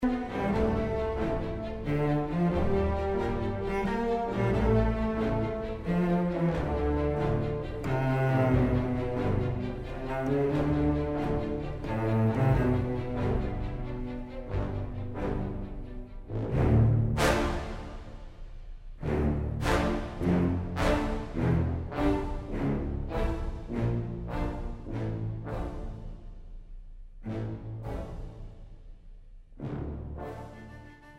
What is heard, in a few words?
orchestral